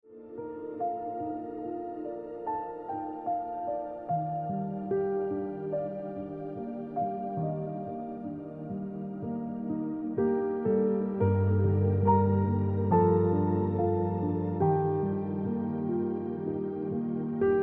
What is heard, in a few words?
Piano Music